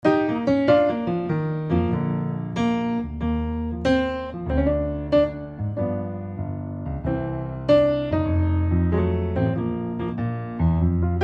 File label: O Christmas Tree Upbeat; Trad (PD)